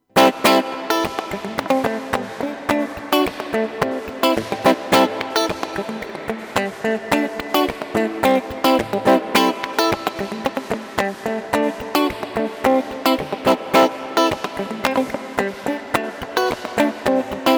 Stereo Reverb; Neunaber Illumine

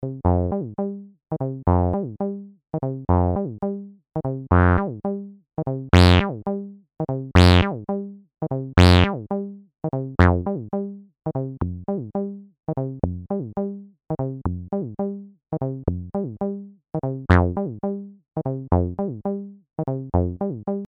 TD-3 Accent Decay

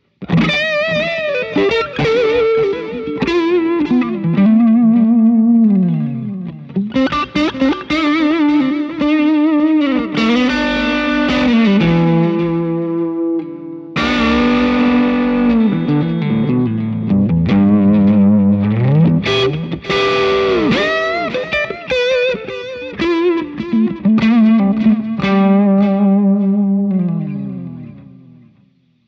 Lead FX Ribbon; Walrus Audio ACS1 v2 Dartford

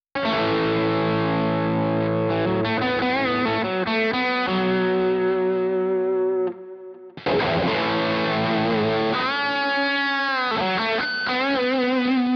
PlexiRanger; Headrush Core Cloned

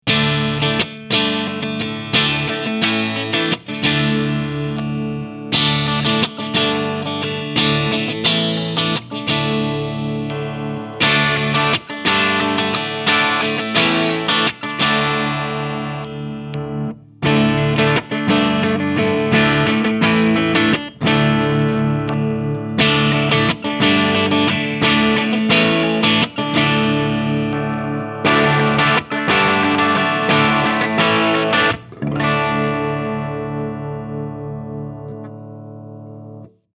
Baritone Clean; Solar Guitars V2

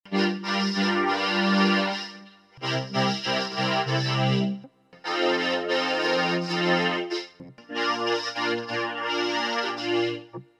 vocoder; Prog 175 Mr Vocoder SE VOC

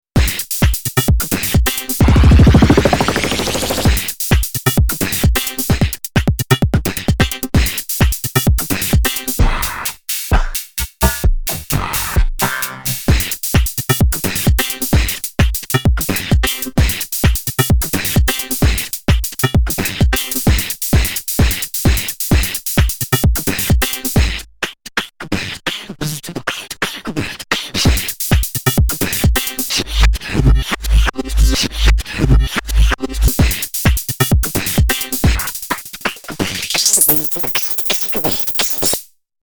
Beat FX

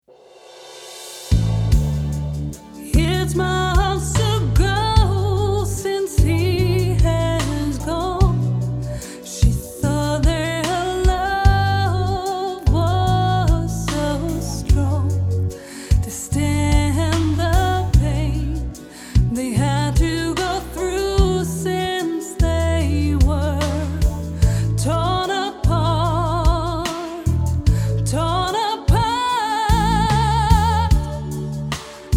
Reverb LOGIC Space Designer

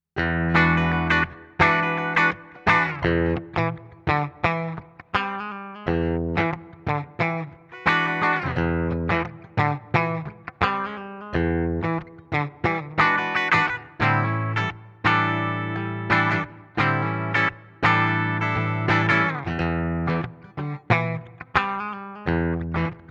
X700 Bridge Humbucker Clean Voice 2 Split; Cort

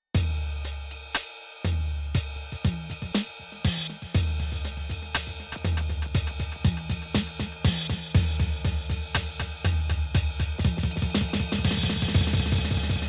NTS-3 Drum PingPong

